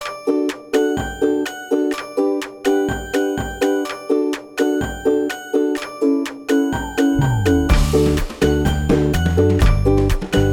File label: Kids Game